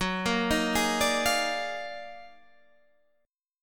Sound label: D sharp Minor Major 11th F sharp